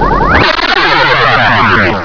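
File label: hyperspace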